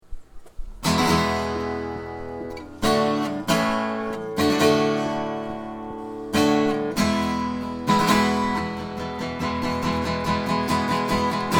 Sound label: School Song; Northlake Woods